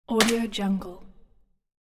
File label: juicy impact